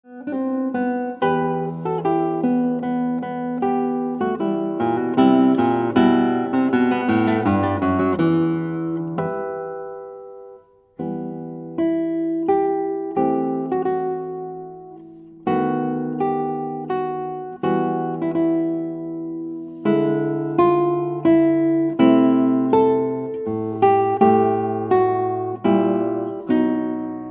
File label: Guitar